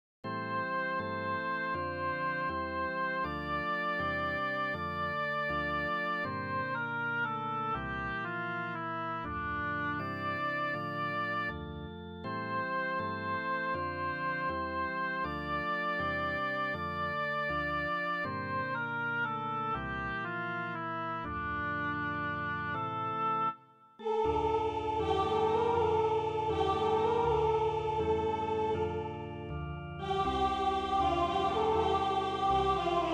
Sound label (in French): Priere universelle en arabe hebreu latin; Messe de Verrieres